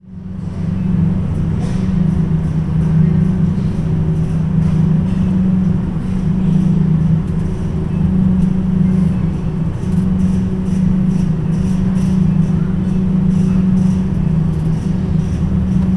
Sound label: drone